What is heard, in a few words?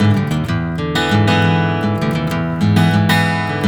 BARI RIFF, Blues Guitar; ILIO; Fretworks